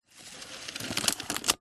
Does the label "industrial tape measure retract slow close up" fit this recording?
Yes